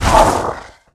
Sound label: hit; Mutantile; SwampOfDarkness